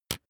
razor fold out; maschinen; rasierer

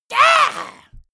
monster assault high; schreie

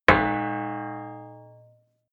plate hit ring stopped dissonant; hits impacts

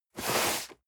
cardboard box medium slide hard; objekte

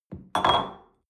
espresso upset table soft, restaurant cafe; sport-freizeit

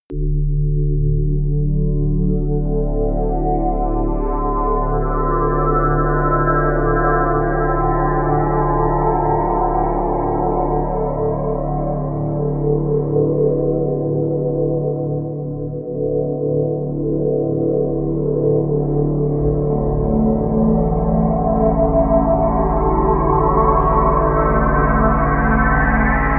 drone organ drone

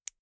insert xlr extension latch; objekte; elektronik

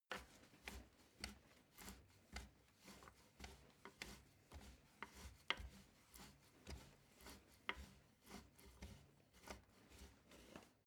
step ladder wood medium; schritte

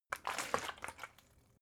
bottle water big shake single; berufe

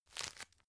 tissue grab soft; objekte; taschentuch

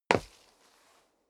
step sneaker wood parquet slide long

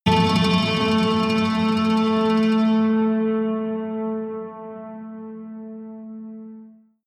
synth hit rattle high

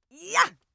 female attack

